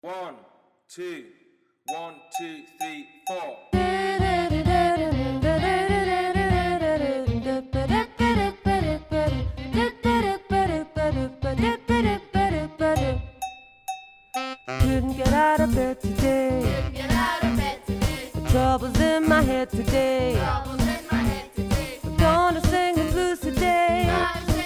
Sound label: Blues Verse